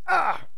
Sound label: Pain, soldier; hellenes